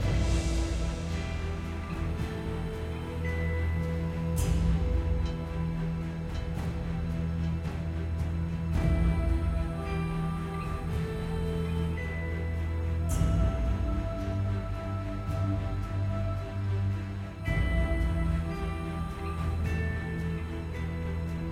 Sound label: High Loop; Macau Fort